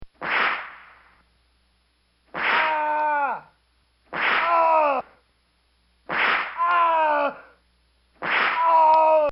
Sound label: Man Being Whipped; Whips